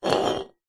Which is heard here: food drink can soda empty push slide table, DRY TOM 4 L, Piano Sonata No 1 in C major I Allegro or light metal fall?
food drink can soda empty push slide table